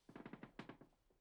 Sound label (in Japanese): ベッドがきしむ音